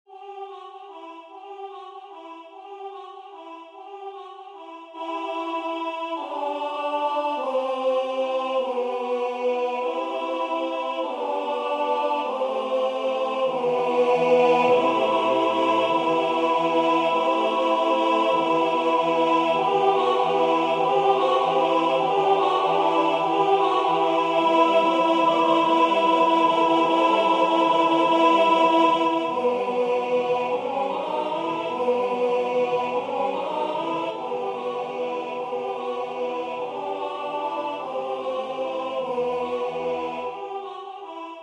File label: ukrainian bell song